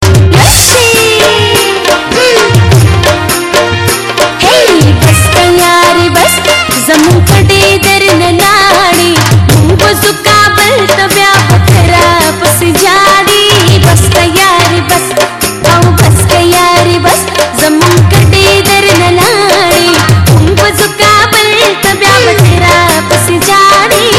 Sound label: Pashto